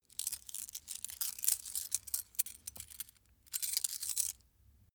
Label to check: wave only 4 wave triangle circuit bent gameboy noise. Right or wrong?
Wrong